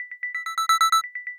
Liquid Detected; iOS 26 Sounds

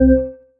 volume beep, Old TalkBack Sounds

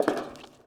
bleacher step; Golden Crayon Sounds

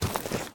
branch step; Golden Crayon Sounds

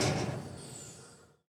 police car stop ext; Original TK Sounds

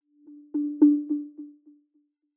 interstitial delay tone; iOS Sounds

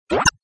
Bubble appear; ESAN VO Sound Schemes; ESAN VO Sounds Pack

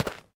dirt land; Golden Crayon Sounds

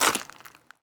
ice step; Golden Crayon Sounds

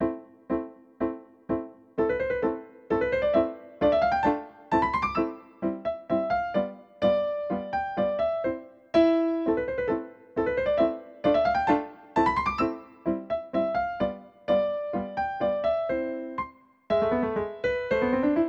arabesque, Yamaha NP-30

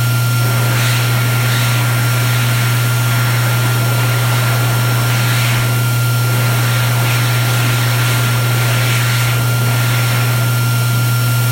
bathroom dryer; Golden Crayon Sounds

